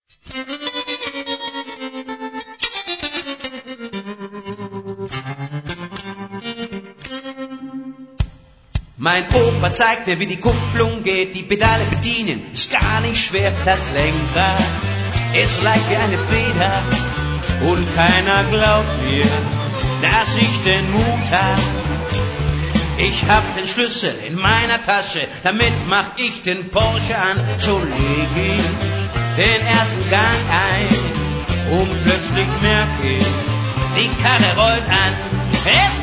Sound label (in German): Autobahnrock